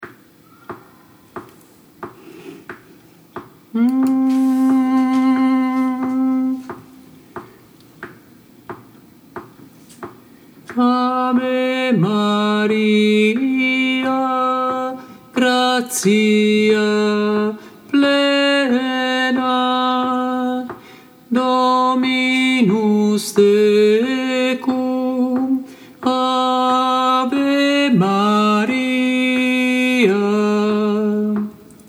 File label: ave maria, tenors; arcadelt